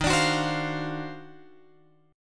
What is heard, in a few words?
D sharp Minor Major 13th D sharp